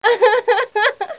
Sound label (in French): rire femme